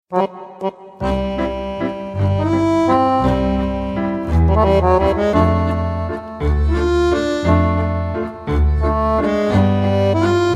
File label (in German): Oktavenwalzer